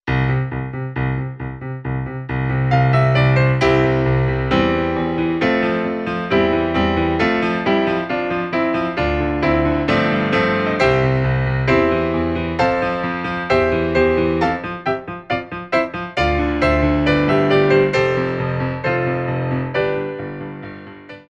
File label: xmas modern melodies